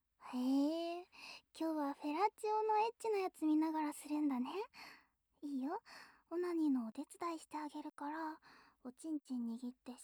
フェラチオ 見ながら オナサポ

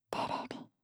テレビ, ASMR; シャッフル睡眠ランダム再生用; 無声音ASMR; シャッフル睡眠法で眠ろう; バイノーラル音声

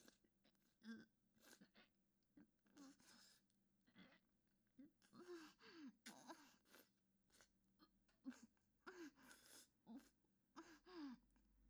フェラ音(嗚咽しながら喉奥フェラ); 裏アカド変態女子の初めてのおもらしオナニー

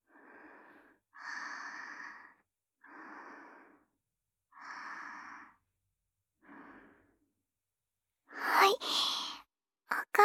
癒やしの耳かき

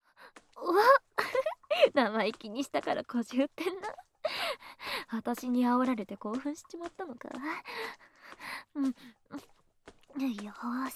耳舐め セックス; 【甘サド】実は貴方を大大大好きな高身長ギャルに愛情ヤバすぎ耳舐め逆レイプされる幸せ学園性活♪【カウントダウンあり】

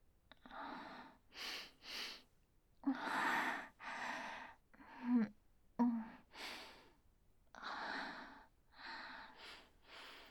チン嗅ぎ(ループ対応); 生意気ナツキはナツキに勝ちたい!; ボーイッシュ×2

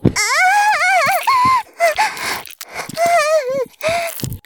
絶頂 中出し; 絶頂+潮吹き+中出し ランダム再生

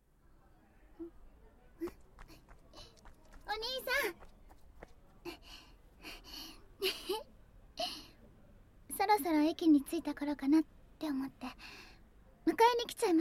夜の公園で耳舐め囁き; ひみつのじゅぽじゅぽフェラチオ。

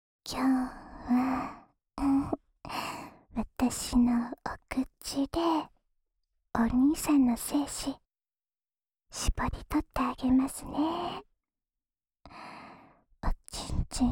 濃厚フェラチオループ

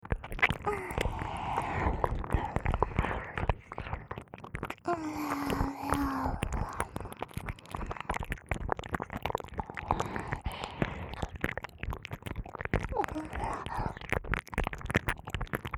両耳舐め

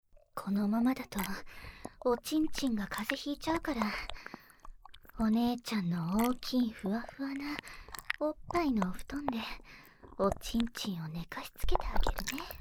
両耳舐め されながら おっぱいで挟まれて フェラ 音声; 甘やかし

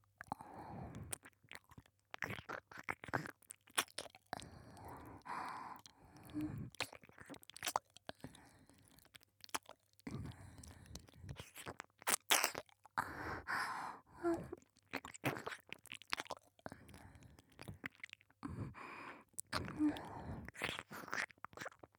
耳舐め 弱い, ASMR; 淫乱メイド

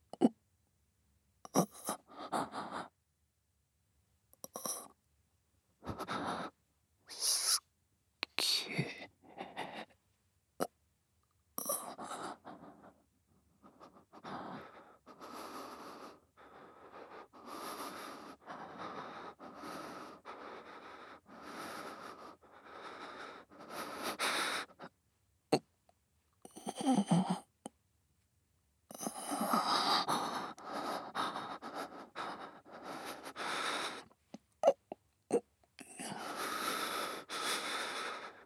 寝息 ボイス

